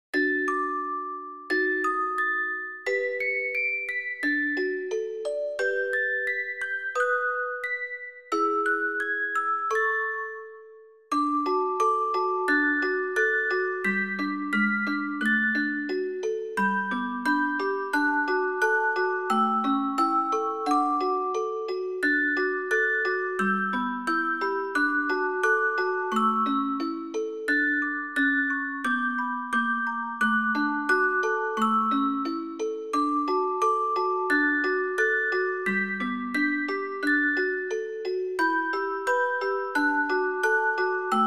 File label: twinkle; Twinkle, Twinkle, Little Star（きらきら星）/フランス民謡